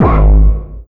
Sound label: hard bass drumz